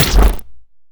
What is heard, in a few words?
time wand